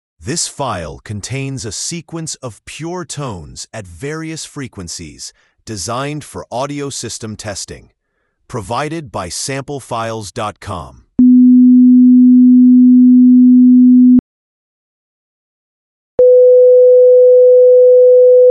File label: tone test